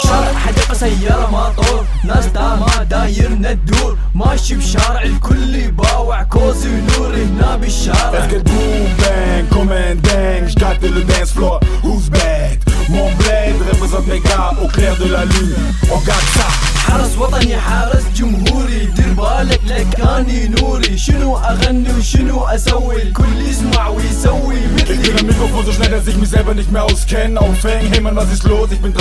Arabic Rap

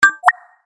Flick

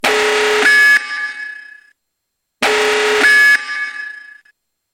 Base Alarm; Halflife2

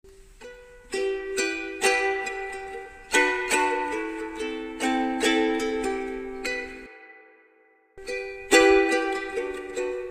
Uke jam

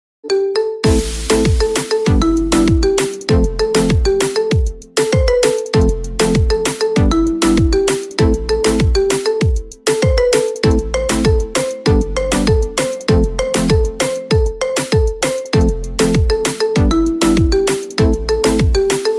Marimba

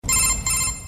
Rising Codec; MG

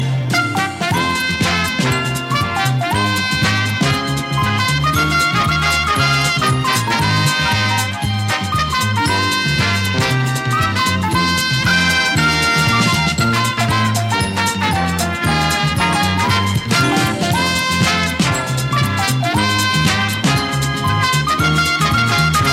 Elevator Song

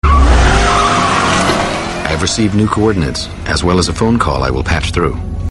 Knightrider Phone

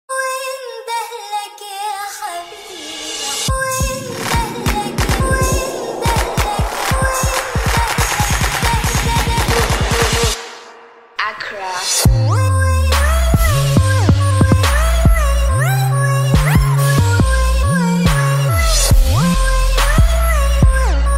Arabic Trap

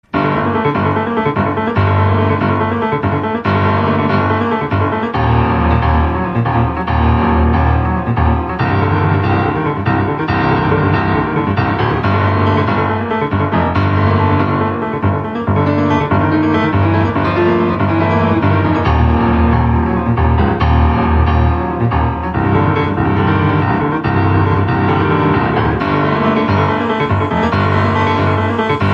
Piano; Walking Dead

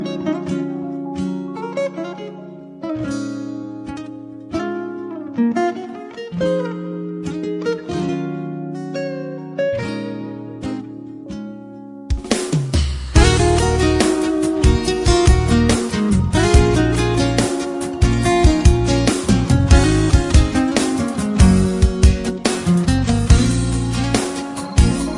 Romantic

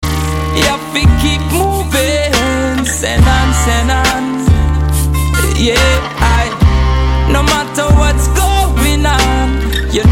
Reggae

